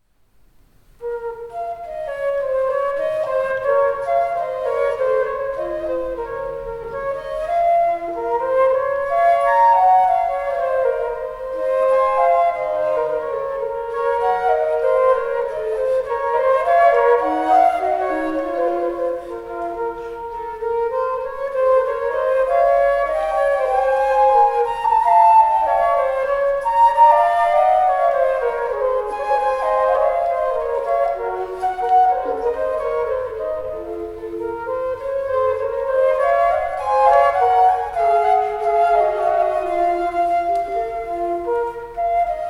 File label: Rondeau duo Flute; Hotteterre